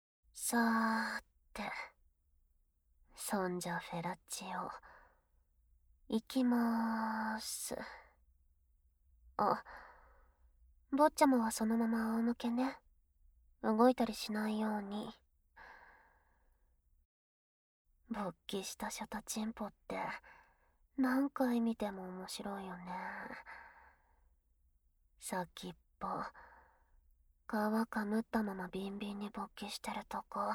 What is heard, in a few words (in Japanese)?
気だるげメイドさんの フェラ; 気だるげメイドさんのちん嗅ぎお掃除フェラ(フェラ); 効果音なし